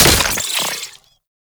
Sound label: Spore Pistol Mech; Weapons